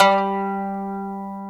KOTO G2, ETHNIC

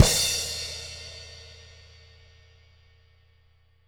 CW KIK -R, Living Drums, SD CYMBALS; Sampleheads; Peter Erskine

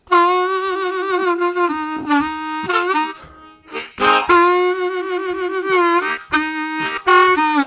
Vibro, Harmonica Riffs; Zero G

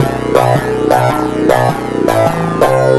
Bass, Synth Bass Loops

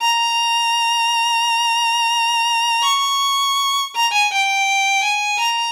Violin, Trip-Hop Loops; Sonic Foundry (Sony Creative Software); Rondo Brothers Trip-Hop Loops & Samples; Hard Rocks The Roof

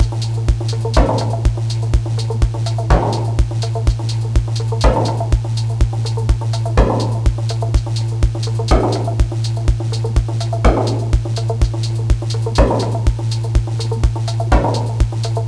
dub cut b, Loops; Polyend

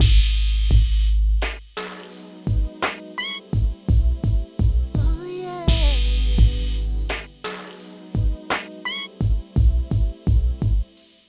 PHATT BEA; E-MU Formula 4000 Series Vol. 1; Hip Hop Nation

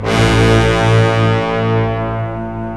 LOW, LOW BRASS; Roland - LA Composer vol. 1

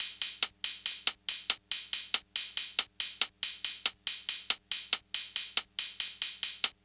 NRG Cymbal; Sonic Foundry (Sony Creative Software); Trance NRG VOL-1

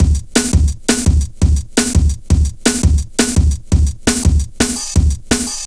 Steppa, Jungle, Drum Loops 169.5; Zero-G Jungle Warfare 1, 2 & 3

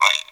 GUIRO; E-MU Formula 4000 Series Vol. 1; Hip Hop Nation

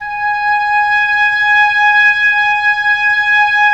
WND OB P, Oboe p; Roland - LA Composer vol. 4

